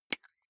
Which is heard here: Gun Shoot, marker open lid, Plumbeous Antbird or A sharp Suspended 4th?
marker open lid